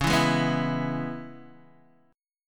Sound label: Db Minor 7th Db Guitar Open D